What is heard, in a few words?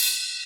Ride; Equipped Music; Loungin House